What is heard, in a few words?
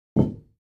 Free Weights Exerci